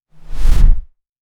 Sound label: quick swoosh of air